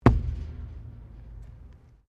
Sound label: METAL IMPACT THUD; Materials